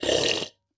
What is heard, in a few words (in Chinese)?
流浪商人饮用挤奶声